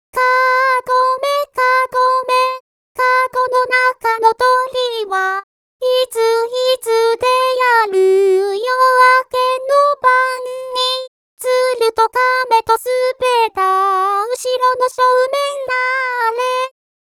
kagome kagome, traditional vocal only

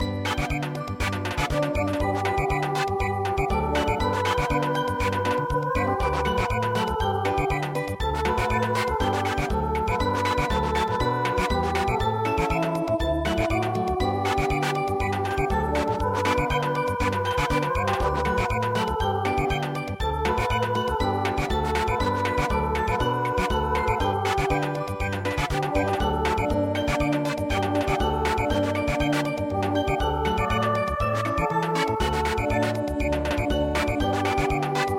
Marche Triomphale Samba; midi; traditional

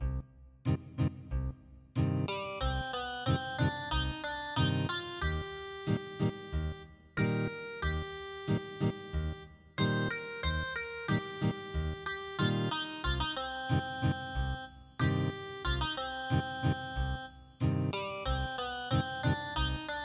Ethnic Guitar, traditional

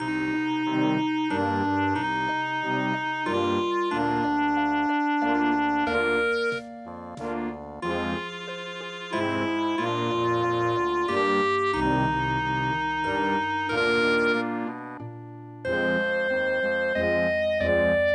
Vienna Waltz

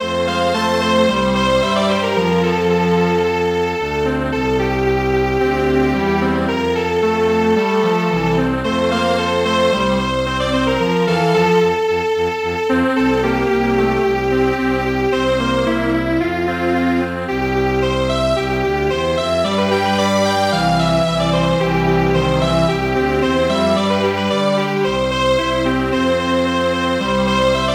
Polka Strings Quartet; midi; traditional

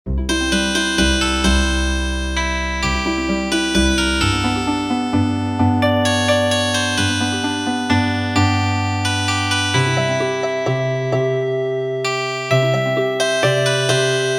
Healing Harp

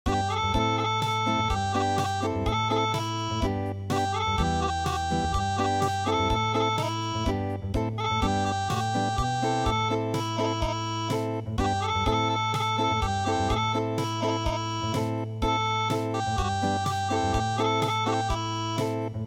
Acoustic

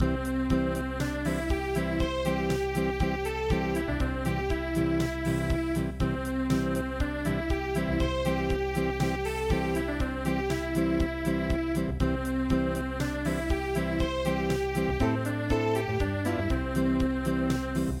Unplugged; gondola song

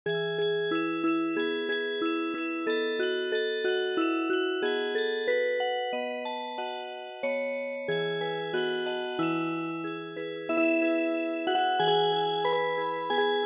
choo choo train Music Box

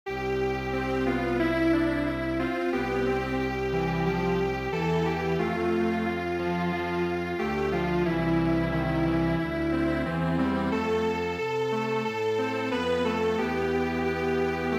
Strings Quartet

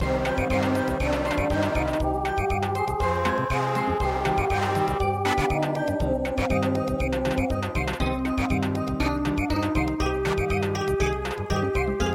Samba; ferryman song

